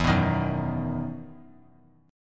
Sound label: E Major 7th Suspended 2nd; EM7sus2 strummed